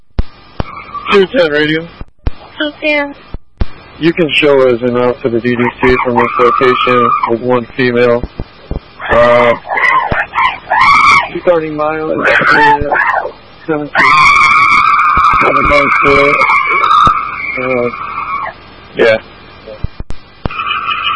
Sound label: scream